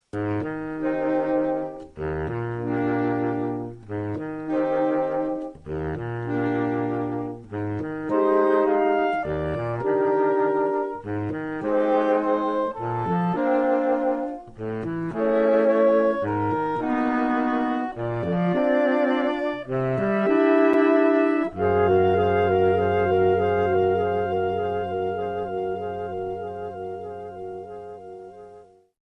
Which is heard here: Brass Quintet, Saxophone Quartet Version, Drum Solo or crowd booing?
Saxophone Quartet Version